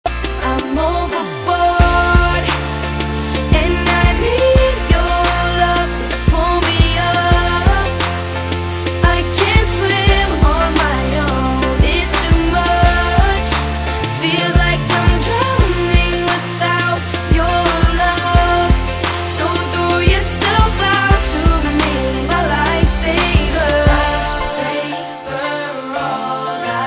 english ringtone

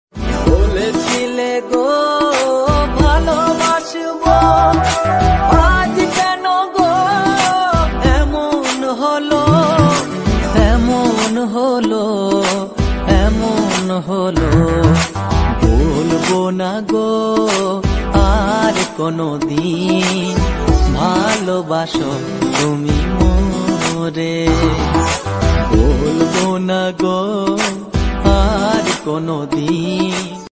bengali ringtone

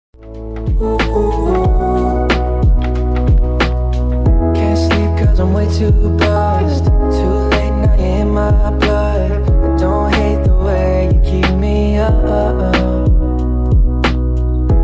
ringtone

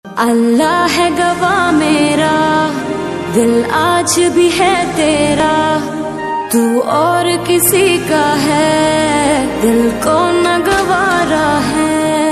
Ost pakistani ringtone

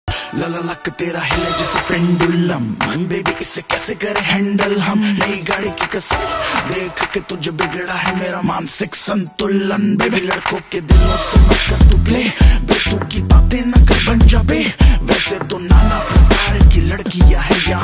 rap ringtone

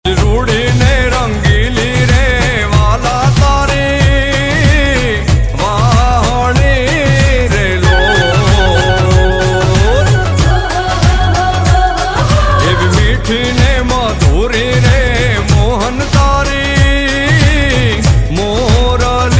gujarati ringtone

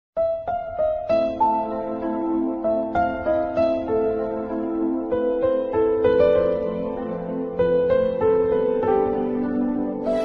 Instrumental ringtone

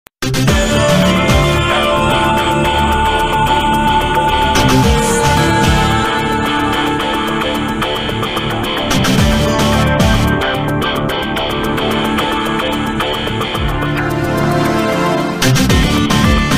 instrumental ringtone

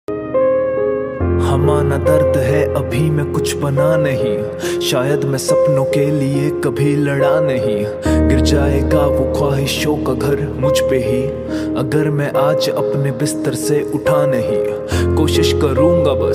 Rap ringtone hindi ringtone

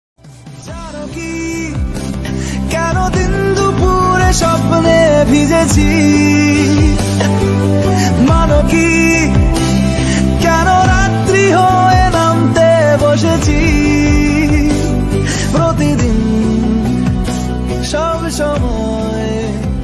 bengali ringtone

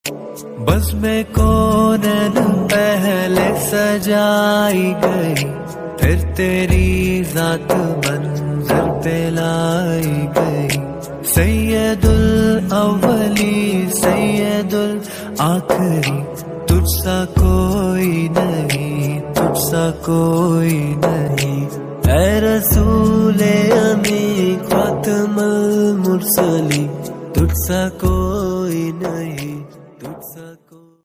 islamic ringtone

